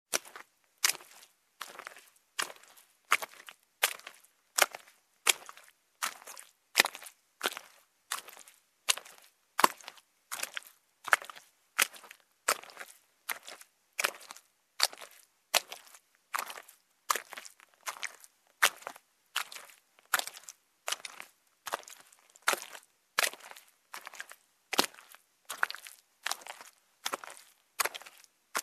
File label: the sound of footsteps in the mud